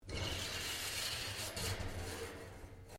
METAL TAPE MOVE; Sound Effects:Materials; Foundation3

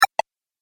Game Pause, video game

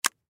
Cell Close, Cell Telephone Flip Close; Cell Phones; Electronics; Blastwave

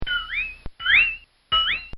Comical Sound Series Ping Zip; Funny Sounds

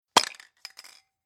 Spray Can Open Cap Metal Rattle Empty; Tom Hutchings

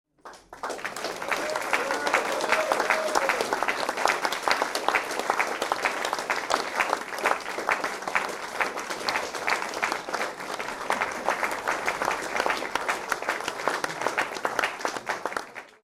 Applause and Vocals small Interior Crowd; Applause Sound Effects

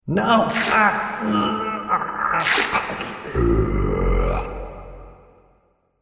Wolfman Man To Beast, Monster; Andrew Fleming

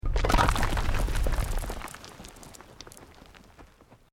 ROCK DIRT SLIDE SMALL

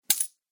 Gold Coins Drop; Video Game Sound Effects

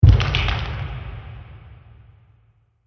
Monster Footstep; Monster Sound Effects; horror

